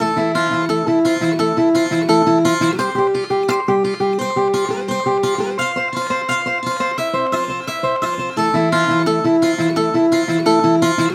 guitar loop amateur folk C maj; COUNTBALDOR

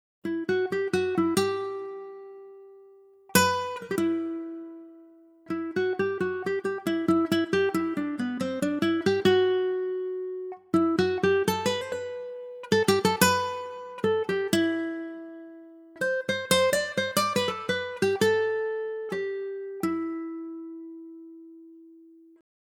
Nylon Guitar Em 91 Wet

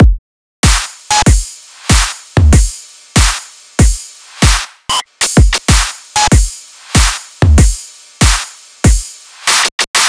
Drum Full, 95

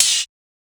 Rides

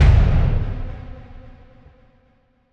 Impact

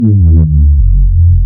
Bass F

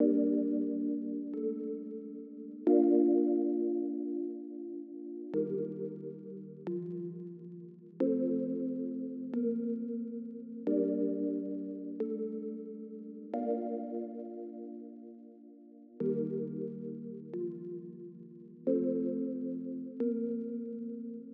Keys Gm 90 BPM

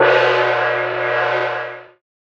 Cymbal

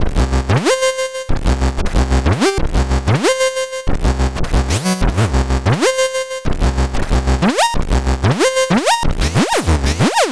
Lead Glider F 93

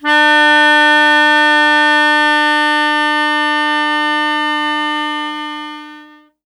Melodica D Hit

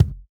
Real Kick

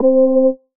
C SYNTH ORGAN

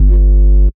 B WARP STAB

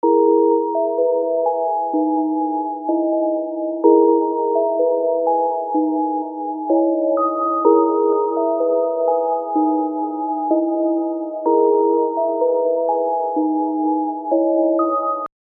Trance Trap Loop; Reflekt Audio

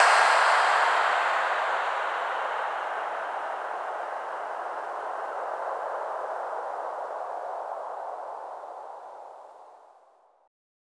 crash intro

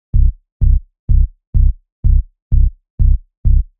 Rolling Synth Bass, C, 126

